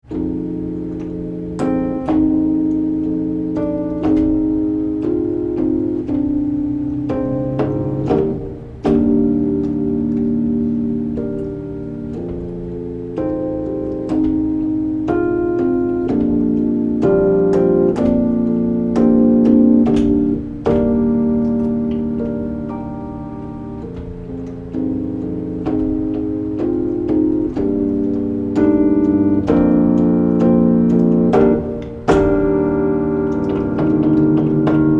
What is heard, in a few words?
piano; improv: E.Piano